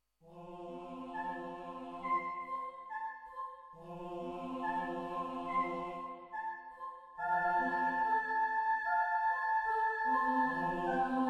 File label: Choir